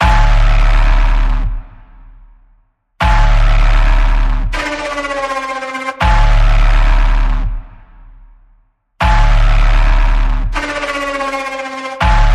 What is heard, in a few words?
Mechanical Massacre Pulse D, Key of D